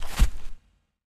LARGE CREATURE FOOTSTEP, monsters

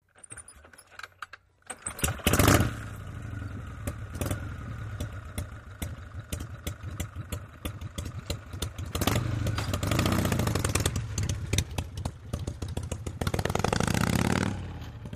M Cycle Harley Kick St

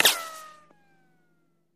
Bullet Ricochet Sin

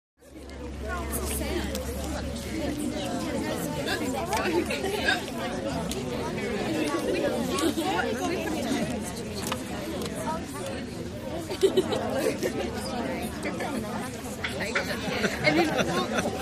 Exterior Mixed Crowd, chatter walla

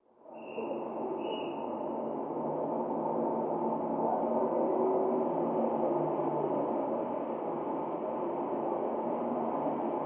Traffic City Noise

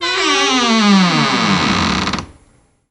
Closet Door Creak

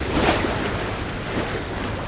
Water Splash Off, bow wash

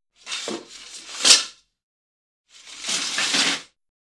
Venetian Blinds Open

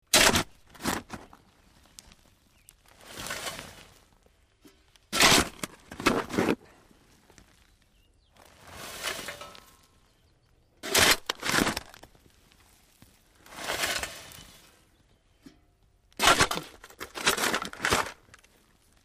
Shovel Dig Dirt Slow D

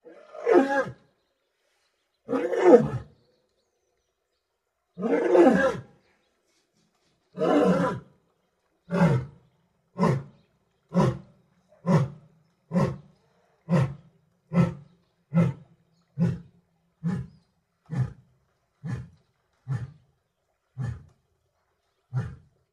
Lion Roar Massive Mul; big cats

